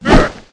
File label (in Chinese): 壮男 死亡 倒地